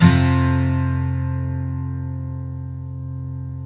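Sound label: STL F Major DN, Guitars steel chords; Spectrasonics; Hans Zimmer Guitars VOL-1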